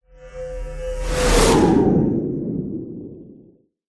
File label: whoosh element pass by machine vehicle; science fiction